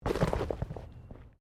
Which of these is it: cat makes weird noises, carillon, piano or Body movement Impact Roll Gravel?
Body movement Impact Roll Gravel